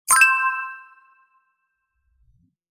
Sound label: coin